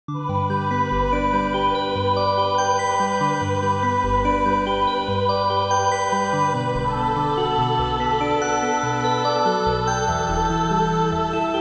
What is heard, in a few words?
AudioKit Synth One Dream Pad improv